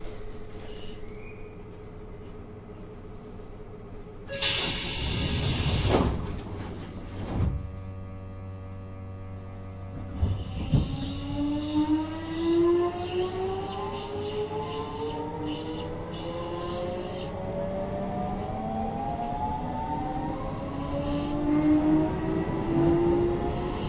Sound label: vvvf, mitsubishi